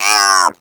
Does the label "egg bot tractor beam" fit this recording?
Yes